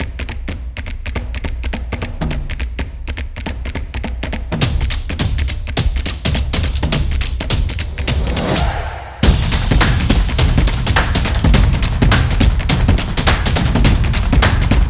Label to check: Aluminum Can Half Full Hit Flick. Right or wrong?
Wrong